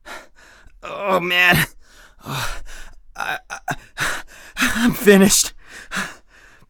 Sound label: hurt near death; Escape from Tarkov; usec2